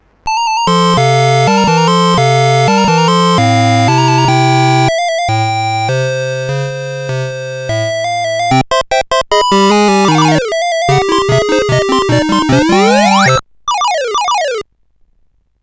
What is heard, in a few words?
Musicbox, sid CSG8580R5